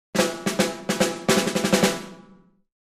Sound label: Drums Snare March